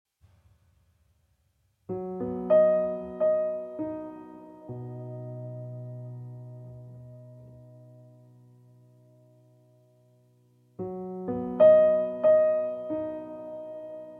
Piano Solo

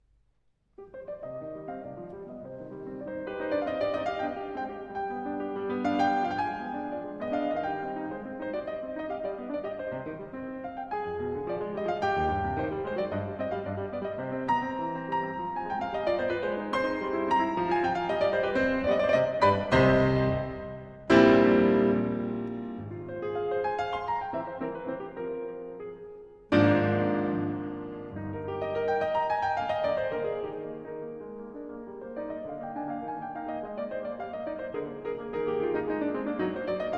Piano Sonata In C Minor, Rondo (Allegro) (Live); Op. 13; Pathétique

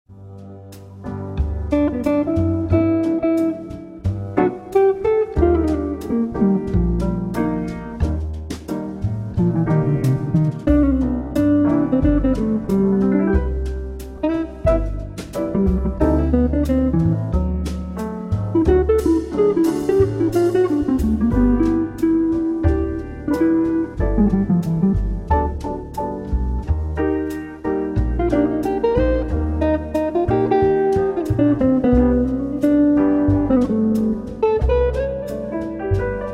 Sax Lounge

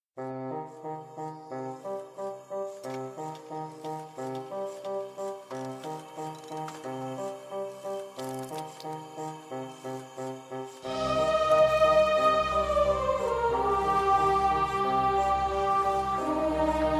Dark Steampunk Music

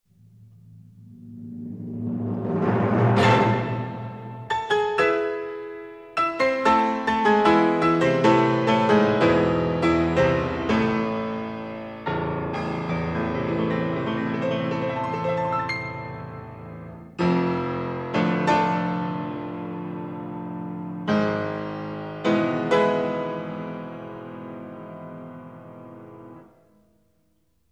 Piano Concerto in A Minor, Allegro Molto Moderato

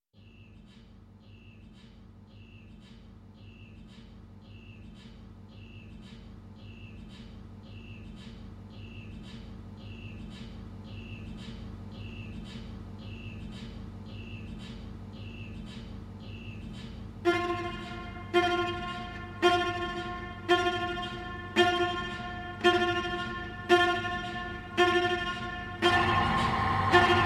Chorale

